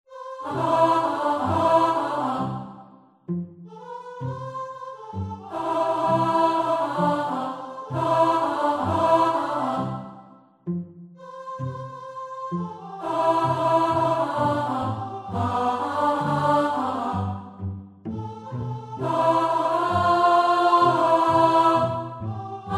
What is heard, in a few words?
Song to sing Alto